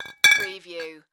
Bottles Wine Movement; sound-effects-bible

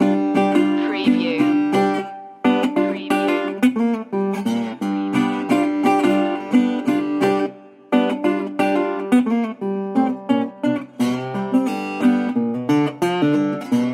blues loop

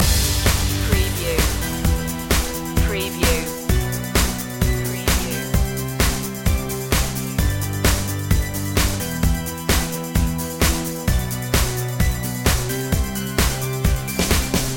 new life loop

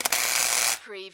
Arc Welder

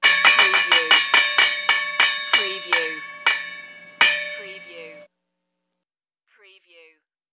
Silly Old Bell

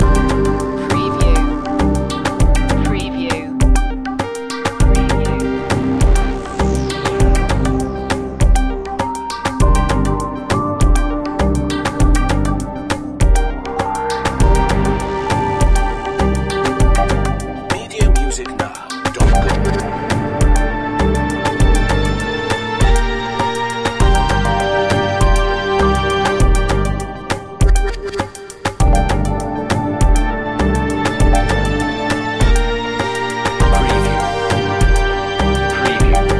the clockwork loop

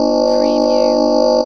Scanner Beam; sound effects bible

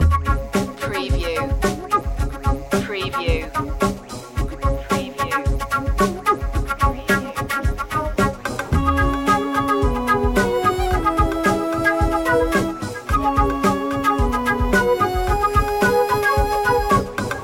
eastern sunrise loop

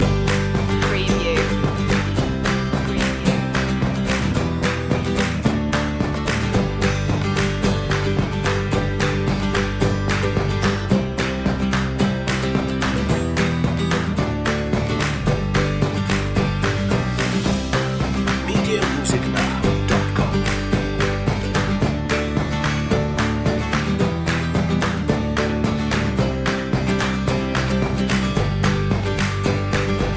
happy as folk loop

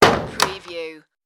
light room door close

